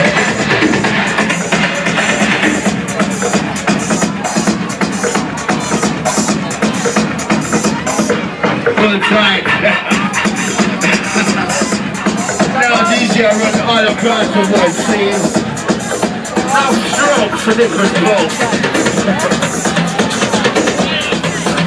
rave

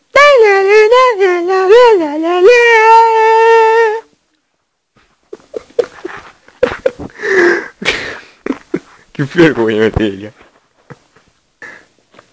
cantando o , singing the

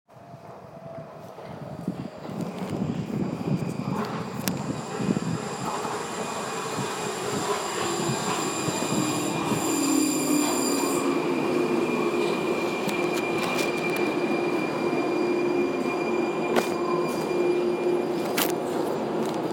metro

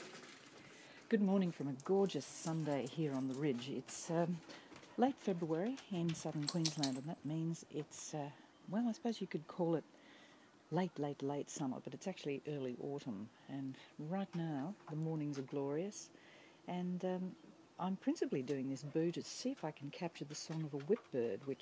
whipbird

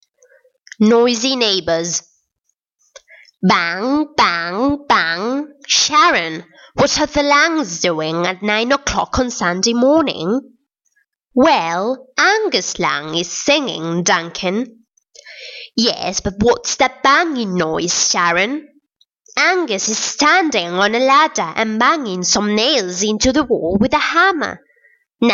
clavier; noisy neighbours; romina

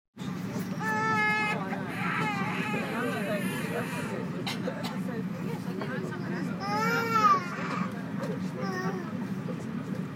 flight current cabin noise; melbourne

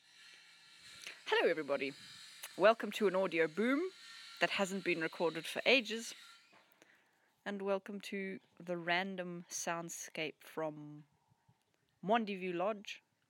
a soundscape from mwandi view lodge in botswana

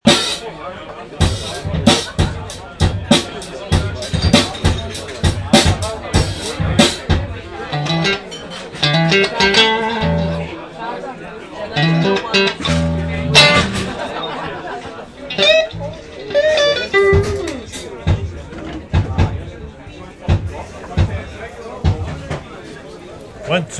soundcheck

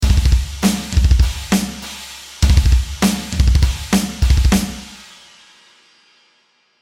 drums

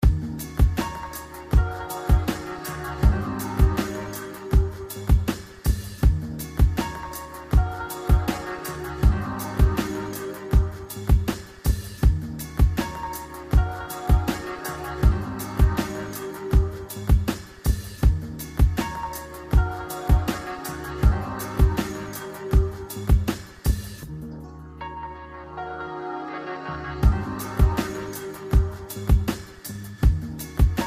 hip hop beat

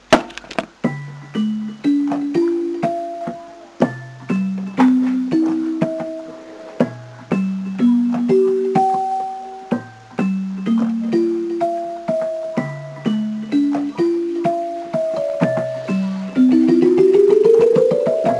lullaby

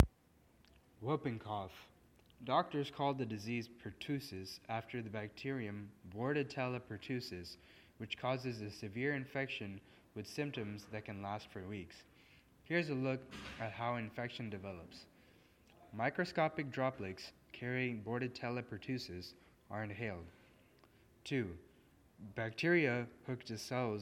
whooping cough

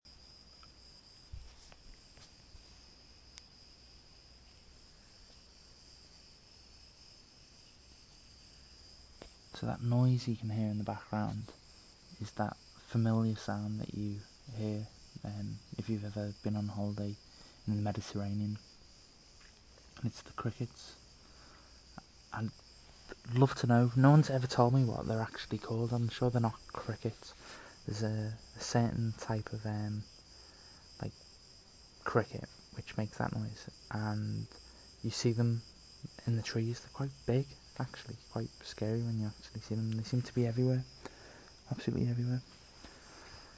crete cricket

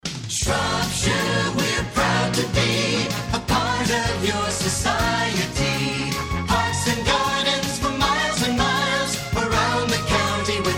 1980s jingle; Radio Shropshire

